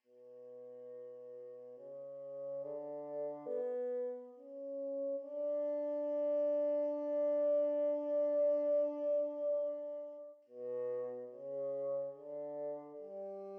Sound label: Bassoon